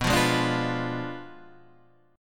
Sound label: B Diminished 7th B down Guitar Standard; strummed; JGuitar